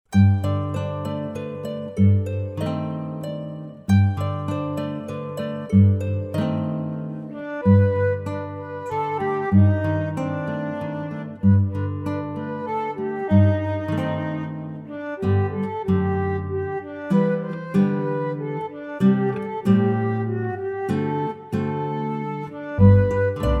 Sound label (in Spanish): Kyrie eleison Salmo 57 Pista con melodia instrumental